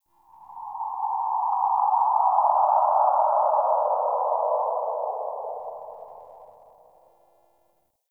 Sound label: Forest Cave Howl Mid; Warframe; Grineer